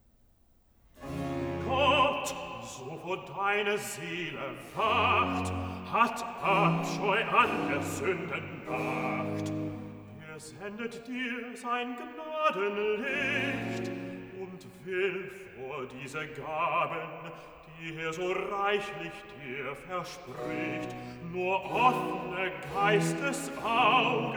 Cantata, Recitativo (Bass); BWV 115 'Mache dich, mein Geist, bereit'; Bach; Tooting; 22nd Sun After Trinity

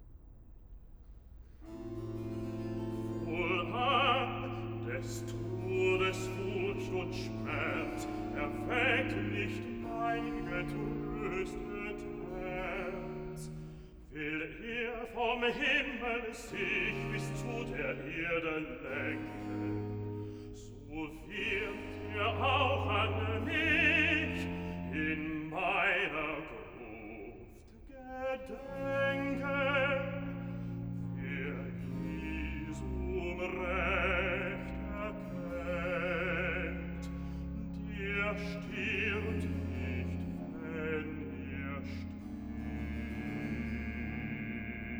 Cantata, Recitativo (Bass); BWV 133 'Ich freue mich in dir'; V. Recitativo (Bass) 'Wohland, des Todes Furcht und Sc; Bach; New York; 3rd Day of Xmas

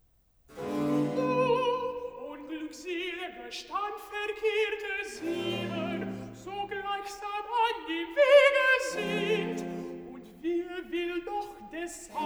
Cantata, Recitativo; BWV 181 'Leichtgesinnte Flattergeister'; Bach; Southwell; Sexagesima

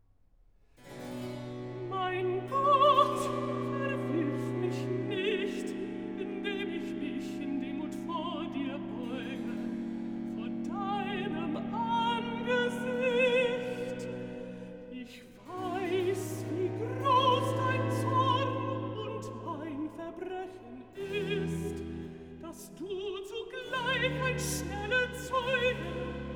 Recitative (alto); Johann Sebastian Bach; BWV 105; Mein Gott, verwirf mich nicht; Cantatas for the 9th Sunday after trinity

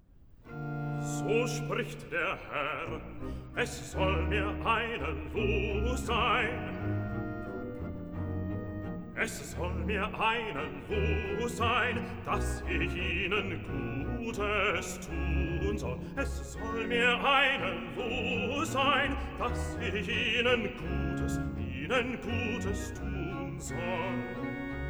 Cantata, Recitativo ed Arioso (Bass); BWV 28 'Gottlob! nun geht das Jahr zu Ende'; Bach; New York; Sun after Xmas

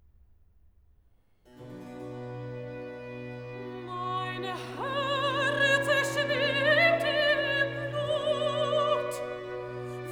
Cantata, Recitative (soprano); Cantata BWV 199; Mein Herze schwimmt im Blut; Johann Sebastian Bach; Gardiner

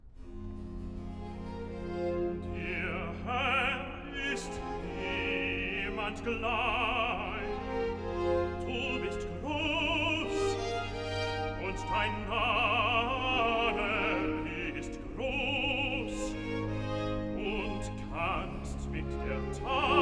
Cantata, Recitativo (Bass); BWV 110 'Unser Mund sei voll Lachens'; Bach; New York; Xmas Day + 2nd Day of Xmas

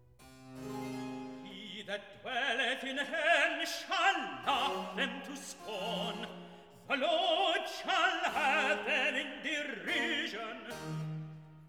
Recitativo (Tenor); Messiah

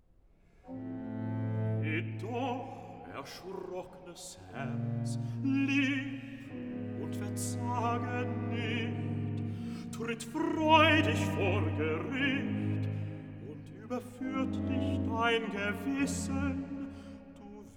Recitative (bass); Johann Sebastian Bach; BWV 168; Jedoch, erschrocknes Herz; Cantatas for the 9th Sunday after trinity

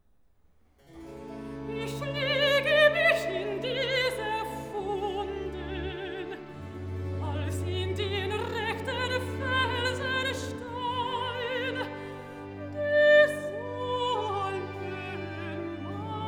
Recitative (soprano); Cantata BWV 199; Ich lege mich in diese Wunden; Johann Sebastian Bach; Gardiner

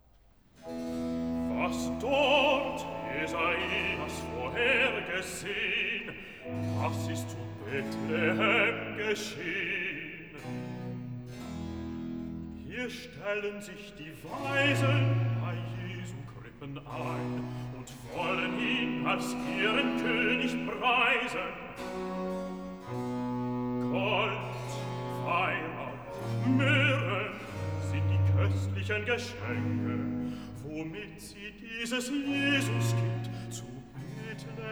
Cantata, Recitativo (Bass); Epiphany; BWV 65 'Sie werden aus Saba alle kommen'; Bach; Weimar; Leipzig

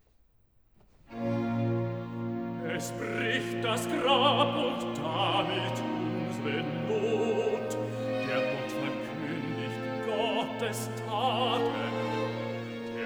Cantata, Recitativo (Bass); BWV 66 'Erfreut euch, ihr Herzen'; Bach; Eisenach; Easter Sun & Mon

